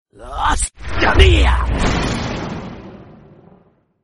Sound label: Aura whisper